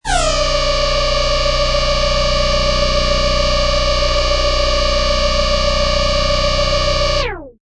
Protons Machine Long, Strange scifi machine start-up, operating a few seconds and stopp; with a long time of rotation inertia.; Science Fiction Sound Effects